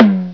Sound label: TOM DRUM